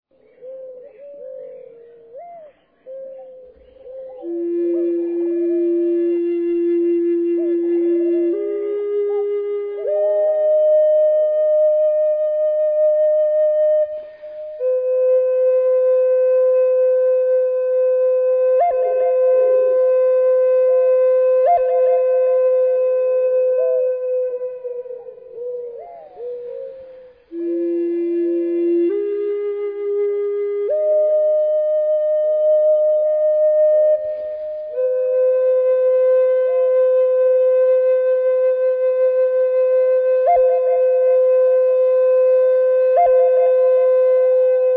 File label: mourning dove